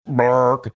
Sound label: funny throw up sound